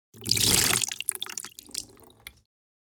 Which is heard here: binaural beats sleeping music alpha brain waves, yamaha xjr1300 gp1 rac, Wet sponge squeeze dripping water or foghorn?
Wet sponge squeeze dripping water